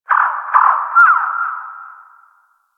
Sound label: Bird Distant; Warframe; Ambience; Eidolon Plains; One Offs